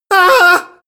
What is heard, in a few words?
male fearful scream high; schreie; menschen; soundpool